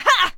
attack, combat creatures default she; CosmicRageSounds

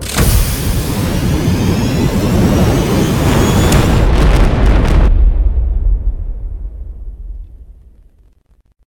launch

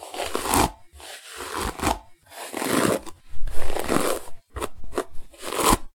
brush, horses

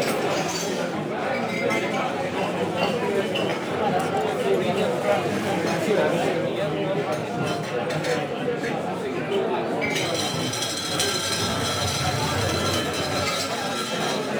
bar, ambiances; CosmicRage

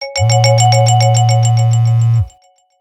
holo; comms; CosmicRage; CosmicRageSounds

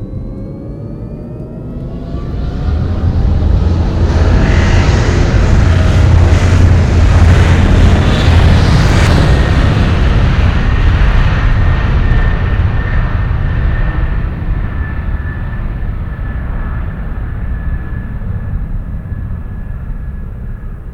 takeoff, aircraft; combat

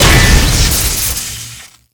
blade slice; ships; Combat

